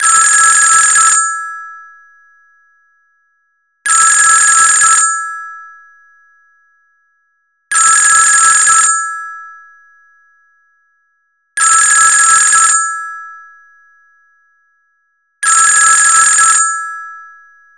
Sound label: Old Phone